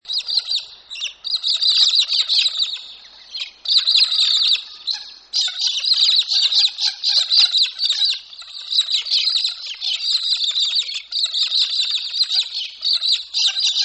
Galah

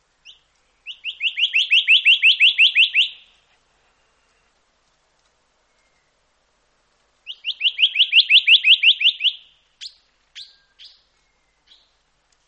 White throated treecreeper

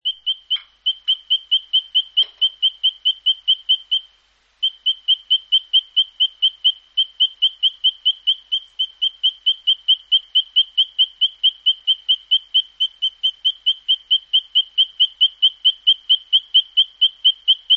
Spinebill

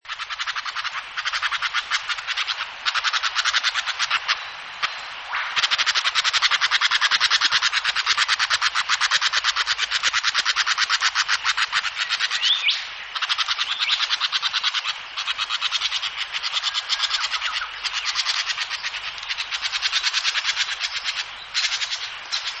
White browed Scrub Wren

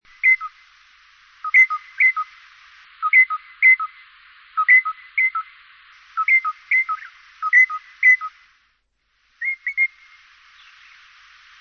Crimson Rosella

